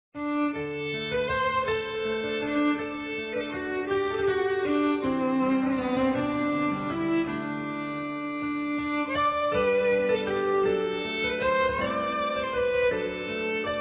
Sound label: Instrumental; Erin Shore